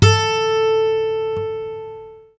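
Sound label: GUI Ac. A2, acoustic guitar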